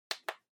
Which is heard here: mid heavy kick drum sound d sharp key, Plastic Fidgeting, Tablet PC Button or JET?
Tablet PC Button